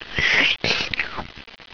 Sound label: SLURP